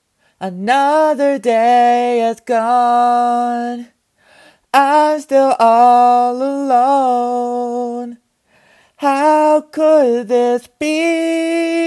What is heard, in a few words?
Singing Demo, Male Autistic Voice Actor & Singer; 26 years old, black, autist